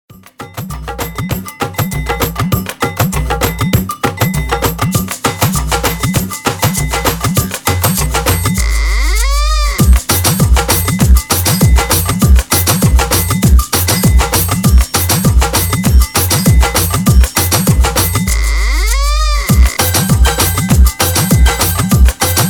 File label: SAMBA (51bpm)